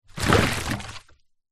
foley wood stick large movement drag in puddle water; zapsplat